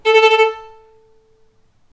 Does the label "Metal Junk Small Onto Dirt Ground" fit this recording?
No